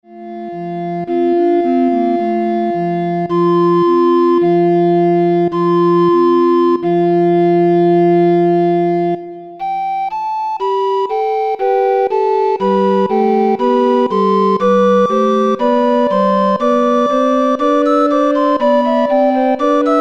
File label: Recorder Ensemble Frere Jacques